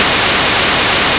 REZZ

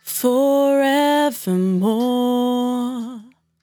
100bpm Bm, Velvet Vocal; Zero-G

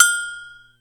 AGOGO HI, RY-30; Hollywood Edge; The Big Bad Beatmachine

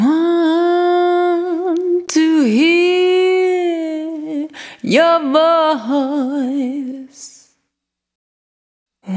Single Voice Melodic Loops; Pocket Diva World Class Vocal Samples

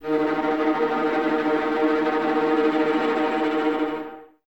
Violas Tremolo D#2, String Ensembles; Miroslav Vitous

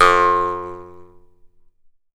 jaw harp, Pitched Percussn, Jaw Harps; E-MU Producer Series Vol. 7 – Old World Instruments

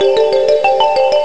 bells; vision bells; Amiga Soundtracker Sample Packs

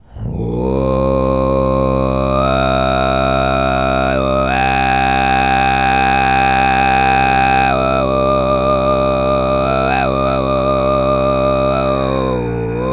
TV FREE, Vocal World Voices TUVAN LOW; ILIO; Vocal Planet VOL-5

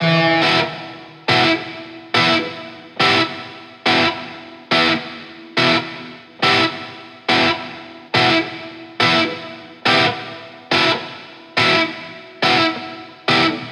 140BPM Emin Guitar FX, Offbeat Dub Guitars; Antidote Advent

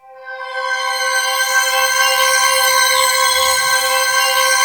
C5, Dream Experience, SCAPE; GANIMED; Q Up Arts

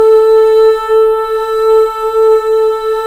Female Ooz; 90s Sample CDs; Club-50 - Foundations Roland

